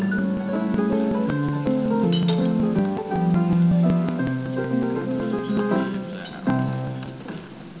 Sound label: PIANO